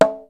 RY-30 PERCUS; Rubber Chicken Software Sample Bank

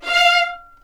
F5 VLNS ARCO DB; East West; The Ultimate String Collection

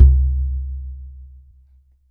Bass Tubano; Sonic Foundry (Sony Creative Software); Continental Drift Aftershocks

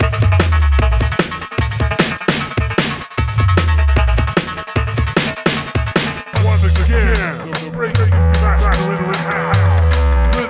Rhythms 151 Ebmin, Hardcore Breakbeat; Big Fish Audio; Rhythms 4 Life

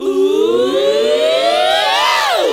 VOX SUNG; Megabass Remix! 2

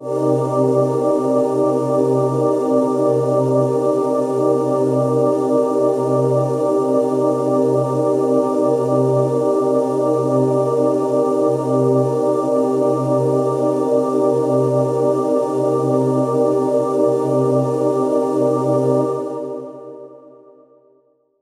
pad voices c min9; M8; M8JUNGLEPAK1

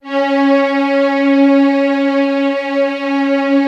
C#4, LOUD VIOLINS; Q Up Arts; Denny Jaeger Master Violin Library

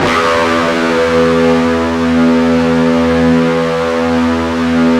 FUZZ E 1, Guitar; East West; Steve Stevens Guitar Samples Collection

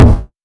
MARKET KICK

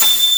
DG WAVE, DIGI SYN

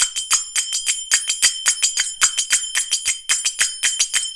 110 CYMB, Indian Traditions; USB Soundscan vol.45; AKAI

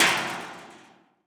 Dumpster, Alesis D4; Efx

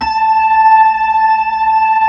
B-3, Blues; Roland LCDP10 Keys of the 60s and 70s 2

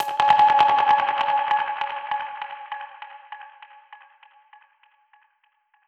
Perc Hit, dub percussion one shots; musicradar

